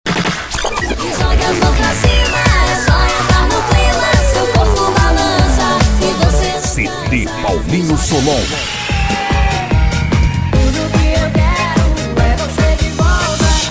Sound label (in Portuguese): dance mix e nacional sernanejo pancadao e funk mix; sinop mt